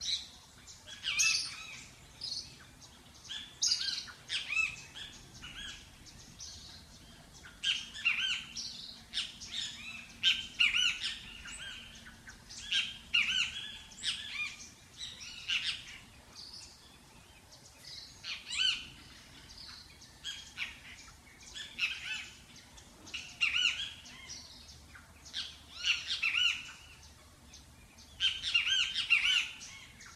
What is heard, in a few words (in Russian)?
divnoe bezmyatezhnoe mnogogolosoe zvuchanie ptic